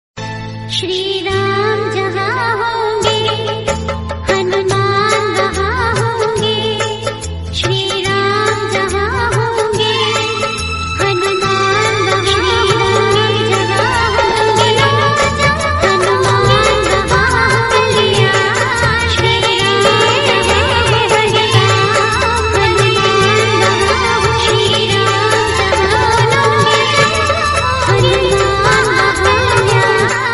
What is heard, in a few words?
Jai Sri Ram phone ringtone